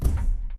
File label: footstep jog; Titanfall 2